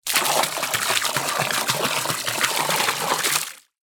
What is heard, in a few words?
water pour splash into deep; zapsplat